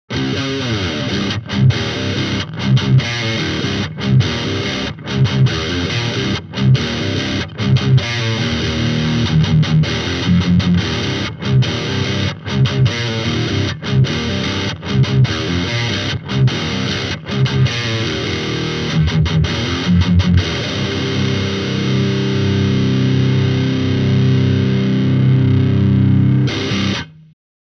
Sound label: metal stringer test